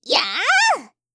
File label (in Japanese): やあ～, 攻撃